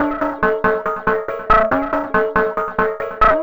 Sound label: TECHNO EXTRA 140